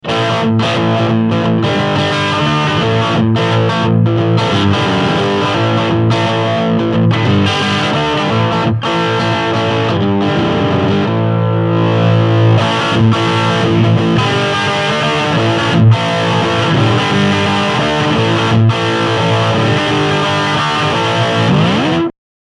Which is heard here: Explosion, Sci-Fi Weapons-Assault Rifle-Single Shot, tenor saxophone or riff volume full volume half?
riff volume full volume half